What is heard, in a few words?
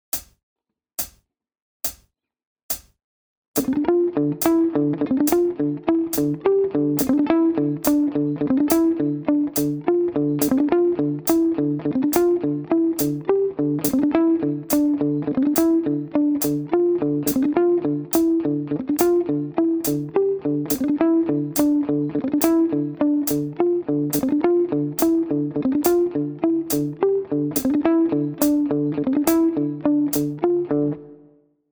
riff